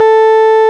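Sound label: RMI piano A 3, EP; Sonic Reality - Vintage Timetraveler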